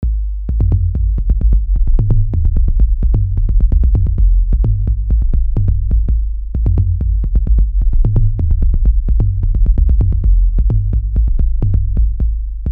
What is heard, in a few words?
BASS